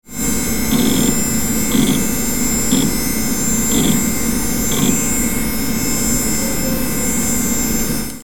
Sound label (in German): IBM platte